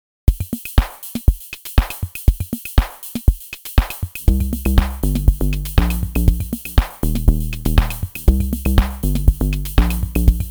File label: Volca Keys